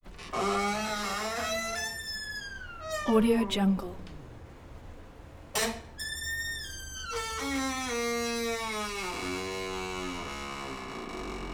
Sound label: door creak loud wood squeal rattle groan